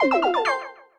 Intro Jingle; Topic Intro Jingle

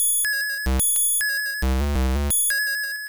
Dream Eater; Pokemon; Gen 1; attack moves; RBY

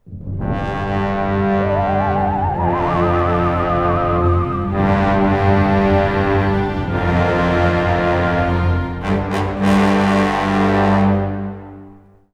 Evil Theremin; Nicktoons Unite

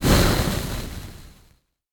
Bushfire Start; boomerang fu

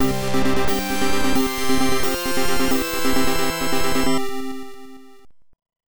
Jingle Fanfare; rpg tsukuru dante98 ii pc-98 1996

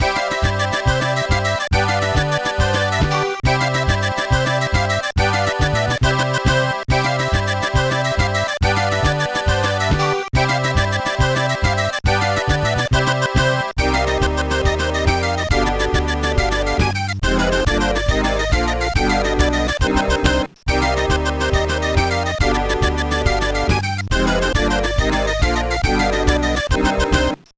BGM; Boxing Fever; GBA

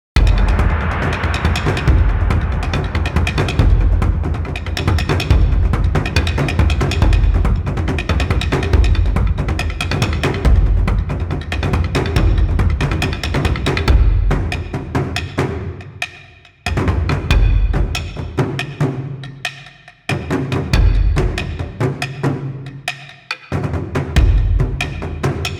Fight; heroes of wu lin online online windows gamerip 2008

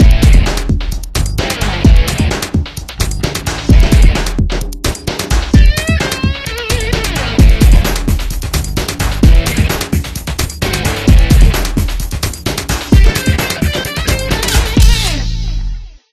Cheerleaders Dance Theme; kurt warners arena football unleashed ps1 gamerip 2000

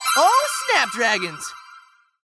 Achievement Earned; Adventure Time Game Wizard Draw Your Own Adventure

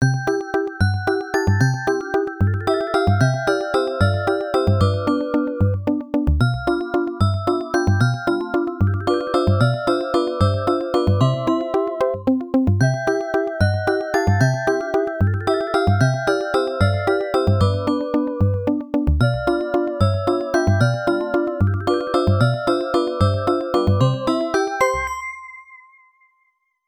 Galactic Dancing (Waltz); galaga 88 namco system 1 arcade

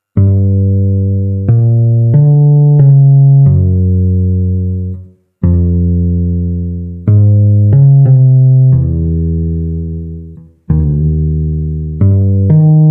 Bass Play; Legend of Zelda The Majora's Mask 3D 2015 3ds

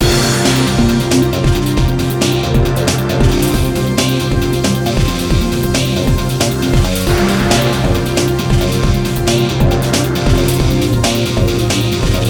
Sonata; Vampire Night

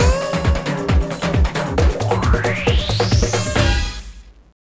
Win

